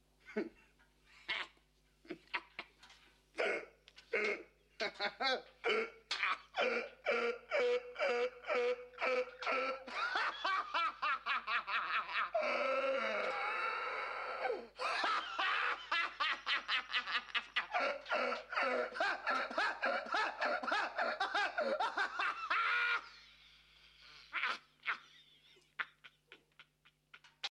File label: muhaha